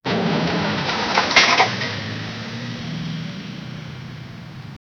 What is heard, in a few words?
two small car crashing